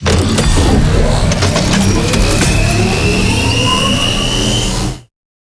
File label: megalaser charge up